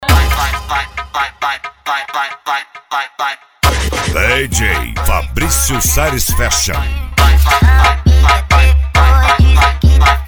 funk

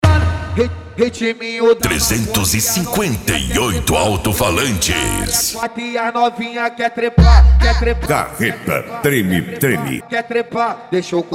funk mega bass

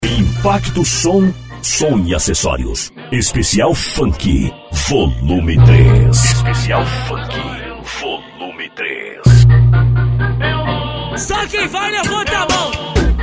funk bass; impacto som

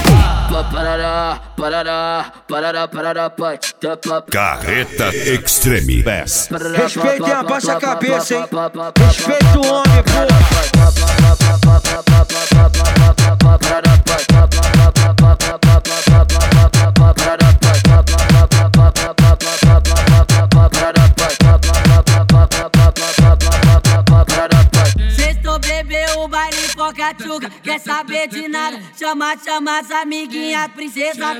extreme bass; carreta

